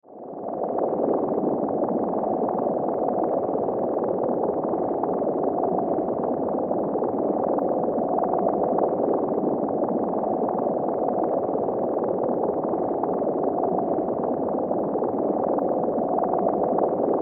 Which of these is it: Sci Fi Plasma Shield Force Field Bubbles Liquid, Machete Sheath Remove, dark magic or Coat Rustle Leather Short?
Sci Fi Plasma Shield Force Field Bubbles Liquid